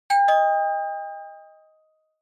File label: Doorbell Fake, Close